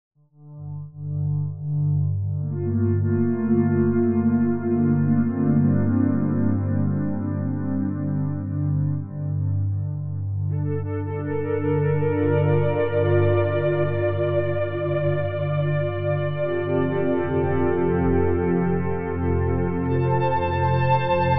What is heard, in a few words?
sine pad